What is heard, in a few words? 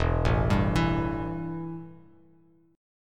E Minor Double Flat 5th E, Bass Standard; arpeggiated; JGuitar